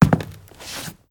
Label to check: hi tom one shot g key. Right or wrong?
Wrong